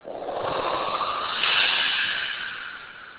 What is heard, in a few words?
blastoff; mars